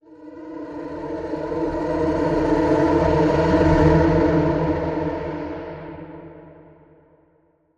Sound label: Vocal Fury Swarm Swell